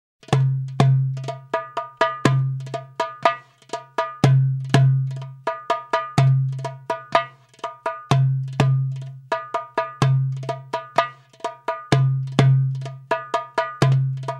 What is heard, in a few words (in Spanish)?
Masmudi Kebir con musica